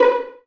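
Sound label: Vio pizzicato B3; Digital Sound Factory Virtuoso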